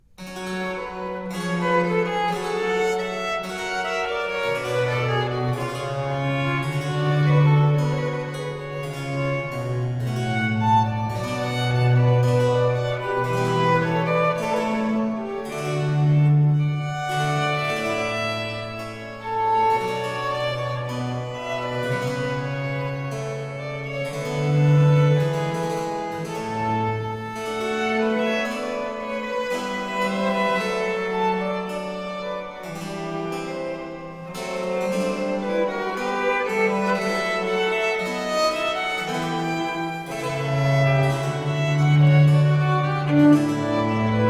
Sonata a Tre in B flat Major for 2 violins continuo - Adagio; opus 1 No4; Antonio Caldara